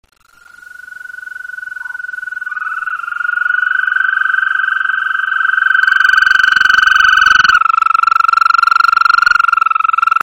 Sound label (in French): chant viridis